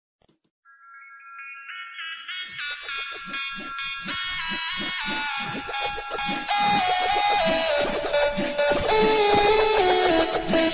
best hip hop background music tone ringtone

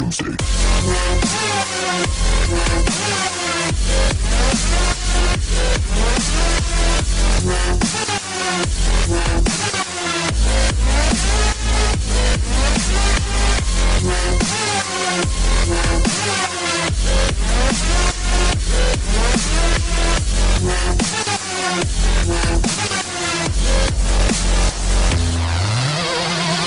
dubstep ringtone

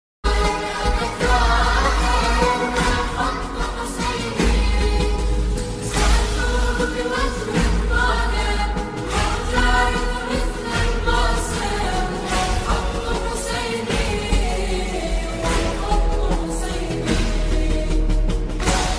slowed reverb ringtone, islamic ringtones

